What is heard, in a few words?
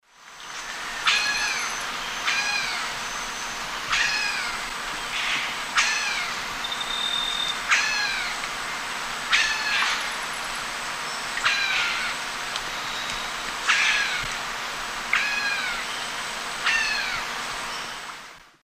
Dendrocolaptes certhia, calls; S. America; Eastern Lowlands